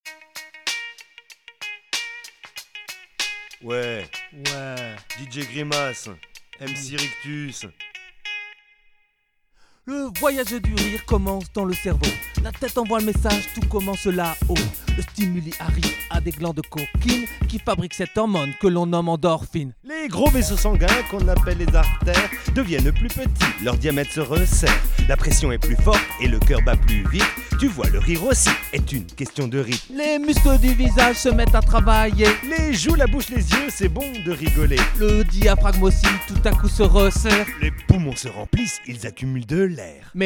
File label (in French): rap rire